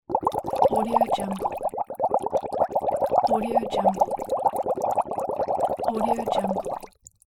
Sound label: boiling